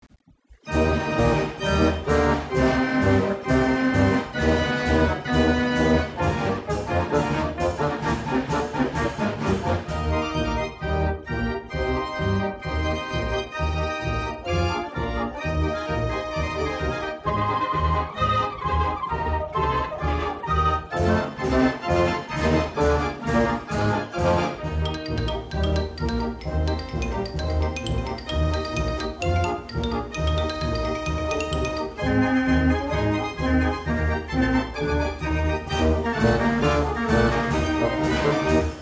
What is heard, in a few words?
BELGIAN BAND ORGAN, Carousel Music